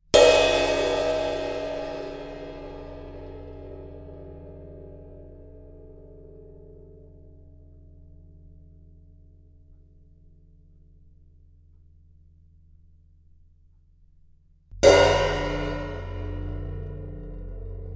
Cymbal Hits - Various cymbal hits; Cymbals; Musical; Tom Hutchings

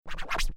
Turntable Scratching; DJ (Sound Effects:Musical)

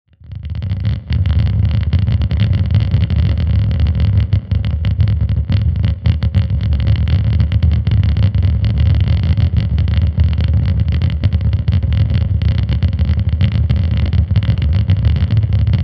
DRONE DARK DIS, Drone Dark Distortion Crackle; Blastwave

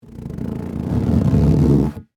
Exercise Ball, Sports, Handle, Large; Miscellaneous Sports; BlastWave

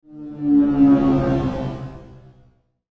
Sci Fi Spaceship; Spaceship Pass By Fast; Spacecraft

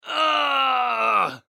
Human Grunt; Grunt Pain Element Male 20 Yrs; Blastwave

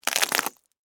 Texture, Crunch, Short, Pasta, Shells; Crunches (Sound Effects:Objects); SoundEffects-BlastWave

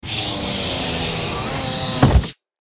Sci Fi Air Lock Door Seal; Blastwave

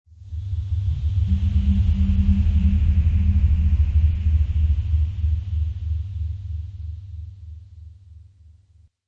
Ectoplasm Ghostly Distance, Ghost Sound Effects

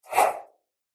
Karate Action Swish, combat swing sound effects; Whoosh Sound Effect; Andrew Fleming

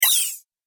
Arcade Game Thin Laser; Video Game Sound Effects; Retro Inspired; Nintendo, Atari, C64

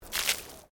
Water Splash Concrete Small

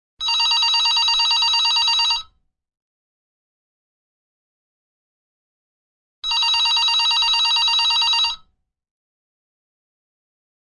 Telephone Digital 3 Rings; Electronics

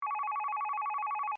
Phone Cell Ring Generic; Cell Phones; Electronics

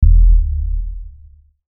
Hit Deep Bass; Hit Deep Bass Drop; Deep Tone; Sound Design; Blastwave

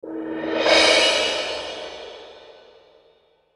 Whoosh Cymbal Metallic; Blastwave; Whoosh Sound Effects

